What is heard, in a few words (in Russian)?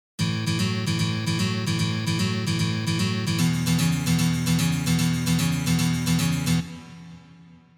Ritmicheskie risunki